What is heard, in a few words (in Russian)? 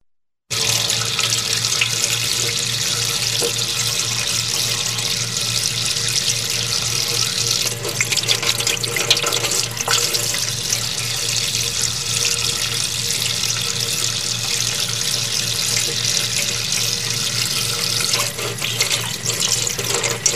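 мытье рук вода бежит из крана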